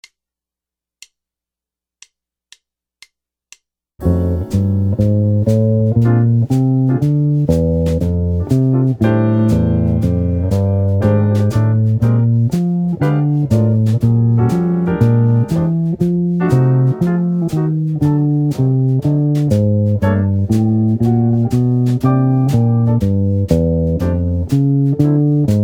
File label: walking bass